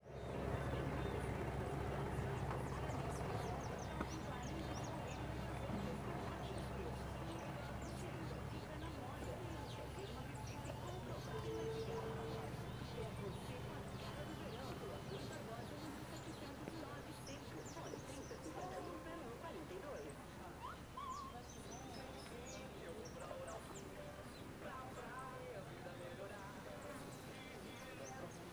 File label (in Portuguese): Ambiente de casa rural com radio ligado; ambiencia domestica